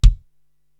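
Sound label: bass drum Dry, Korg TR-Rack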